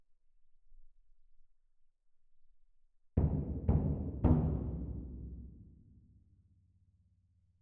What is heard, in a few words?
Perc